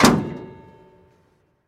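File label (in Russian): негромкий тупой глухой удар по металлу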